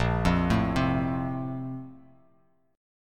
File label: Bb Major 7th Suspended 2nd, Bass Standard; BbM7sus2 arpeggiated; JGuitar